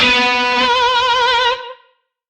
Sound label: Shawzin B; Warframe; Lizzie Shawzin